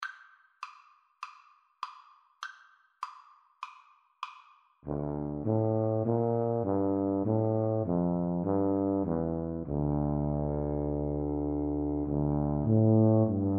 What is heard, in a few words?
Tuba duet